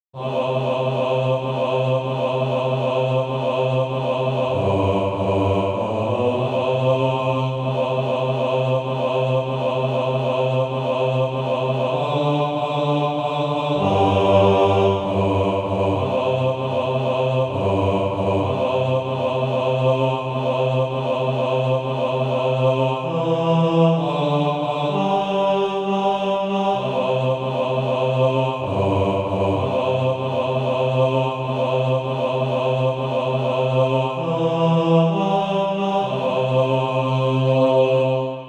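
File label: bass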